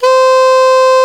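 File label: Soft Soprano E3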